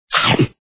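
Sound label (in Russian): поедание укус яблока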